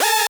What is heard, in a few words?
synth